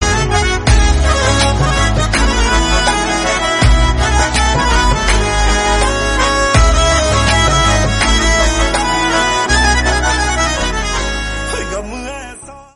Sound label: Instrumental BGM; Qismat 2